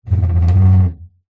furniture chair dining metal framed slide wood floor med rub